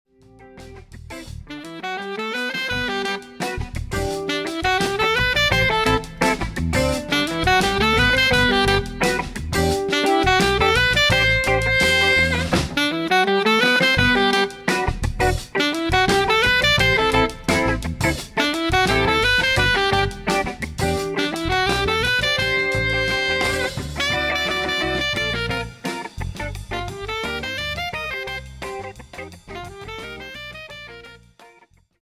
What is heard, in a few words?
upbeat pop jazz